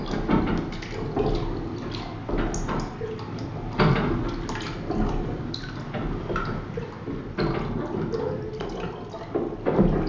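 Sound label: boathouse boat interior ambience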